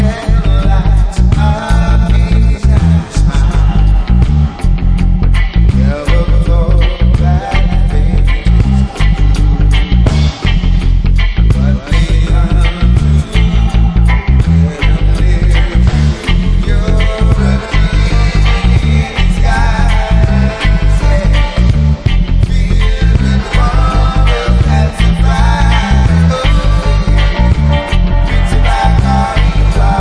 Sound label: Dub Spark